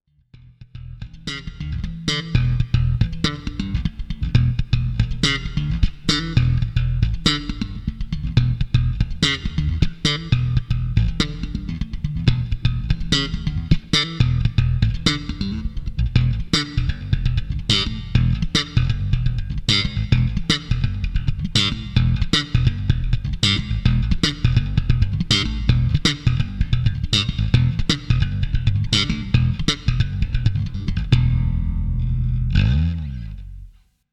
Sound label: Bass Fireworks, piano